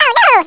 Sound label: lose